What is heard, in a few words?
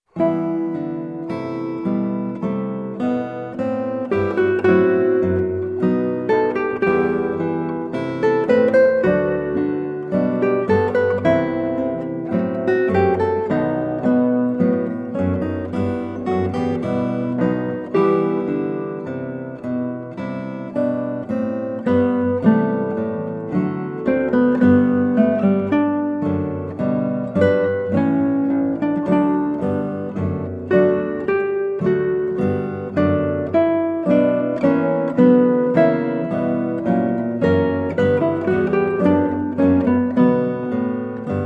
Adagio from Flute Sonata in E Minor HWV 375, Guitar; George Frideric Handel